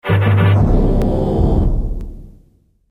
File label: Fanfare Lose; strong bad's cool game for attractive people